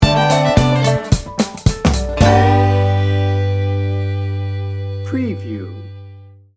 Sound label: nashville dreamin sting bumper